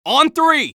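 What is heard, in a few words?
scout taunt rps exert, male voice; taunts; tf2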